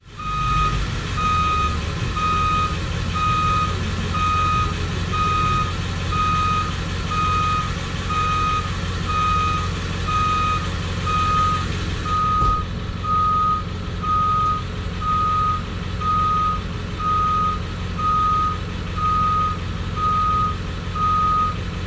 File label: Truck Beep Back Up Exterior